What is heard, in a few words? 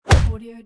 player punch